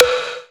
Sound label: synth shot b; vedm